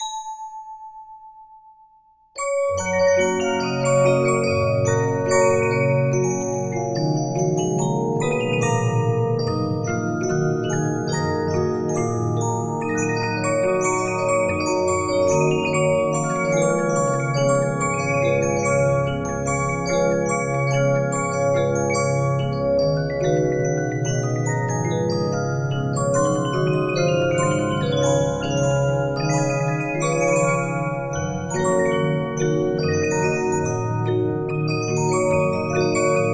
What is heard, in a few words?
Regina, 15inch disc musicbox